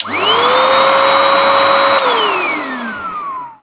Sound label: vacuum